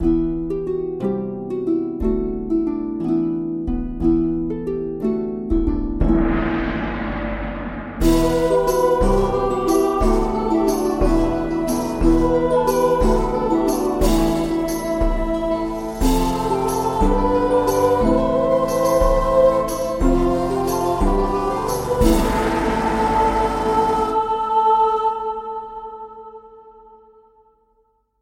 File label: medieval introduction; tristan lohengrin